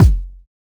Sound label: Kick